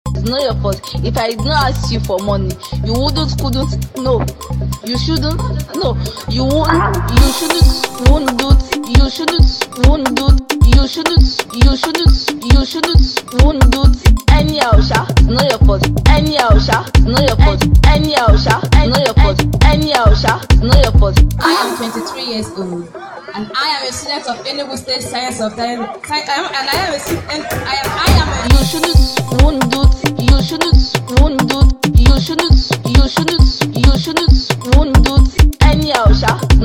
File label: Freebeat